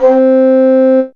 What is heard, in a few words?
Flute, Digital Synths; Ableton Foundation FreePacks